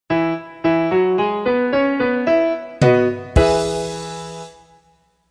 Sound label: Establish E Major; McGraw-Hill; Establish a Key